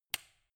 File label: switch turn off